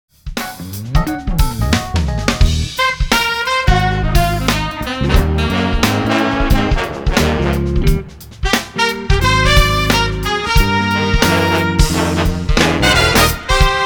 Brass Reed

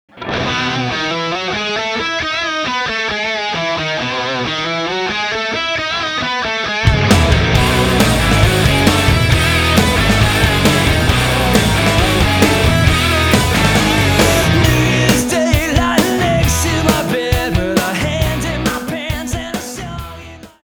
Electric Guitar Hard Rock

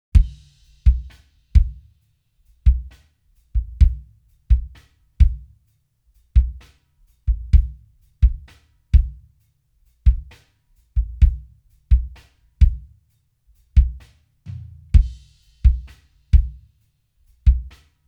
Drums Isolated

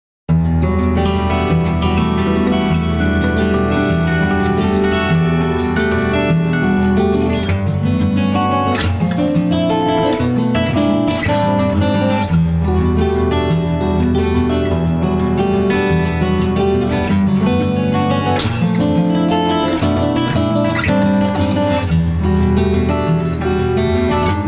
Acoustic Guitar

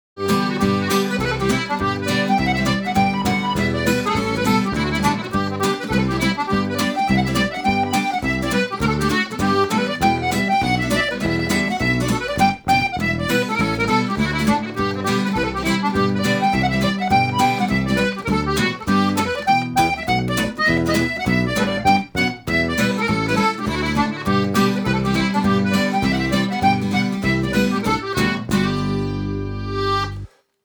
Acoustic Guitar

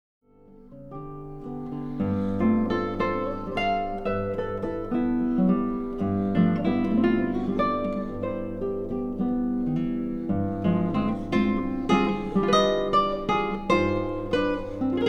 Acoustic Instruments

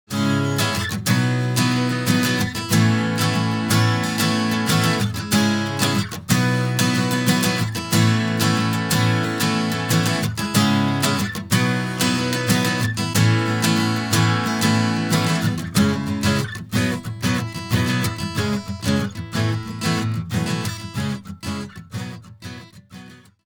Acoustic Guitar

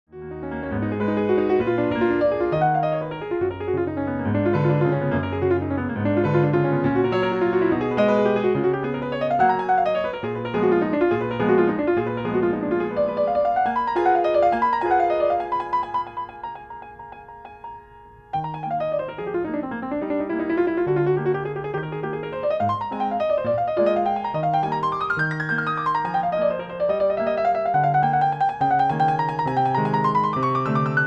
Piano

